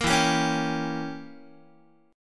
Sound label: E Diminished 7th E, Guitar; Edim7 strummed; JGuitar